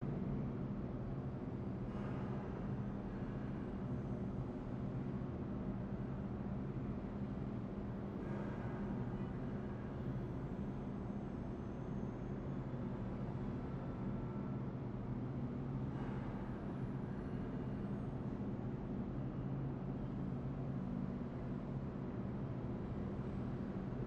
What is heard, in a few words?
Control Room amb